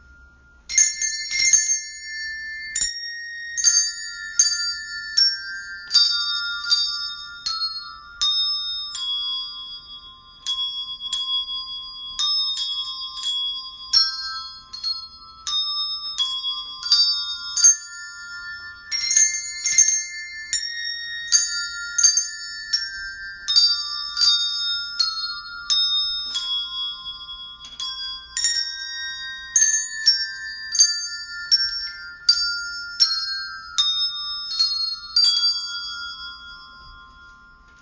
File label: Away in a Manger Bells